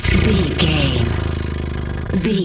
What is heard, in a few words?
cat purr